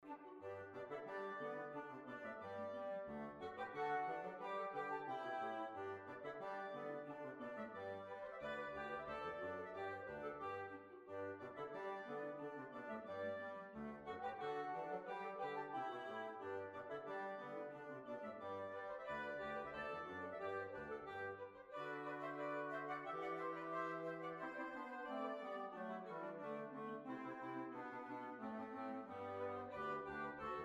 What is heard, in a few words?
Hornpipe; Portobellow Hornpipe